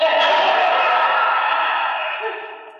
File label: laugh haunted house; Voice; ooh ahh; disney haunted house